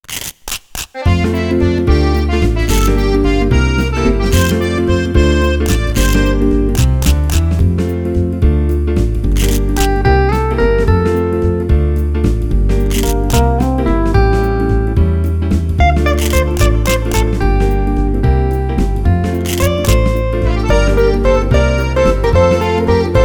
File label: Instrumental